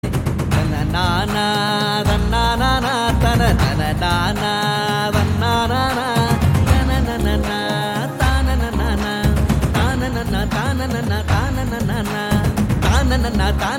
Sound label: Ecstatic Folk Bgm Ringtone; Tamil